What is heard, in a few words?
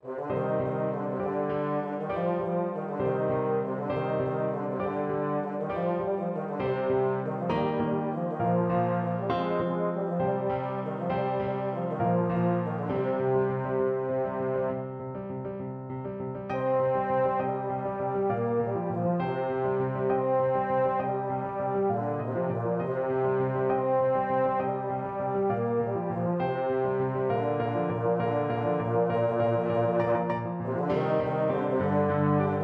pirates euphonium